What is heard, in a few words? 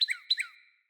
Cardinal Female Bird Double Chirp